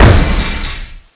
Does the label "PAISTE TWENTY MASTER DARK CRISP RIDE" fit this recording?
No